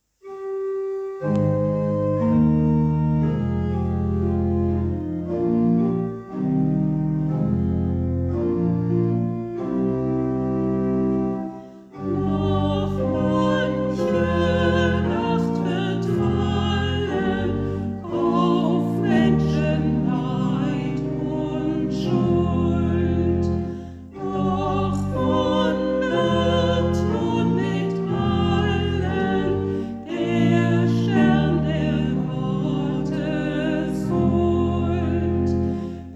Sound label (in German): Orgel Strophe 1